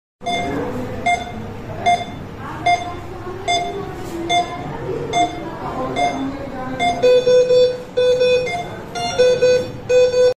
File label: Operating room environment; محیط اتاق عمل